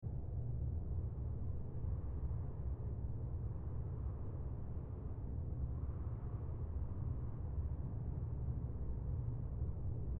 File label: ambient cave; firewatch